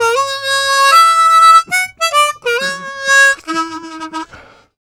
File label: Harm 098bpm A, Harmonica